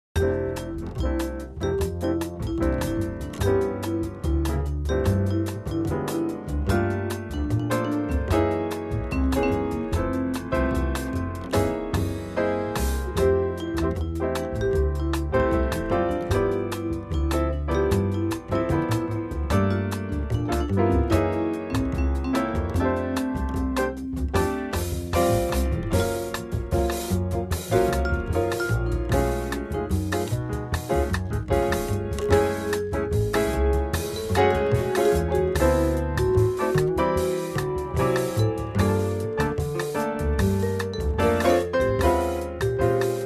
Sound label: Steel Drummer